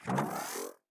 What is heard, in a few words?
popup up; ui; jijia; lineageos